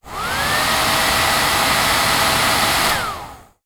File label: Hair dryer long